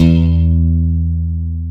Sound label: stick, Chapman Stick; Analog Odyssey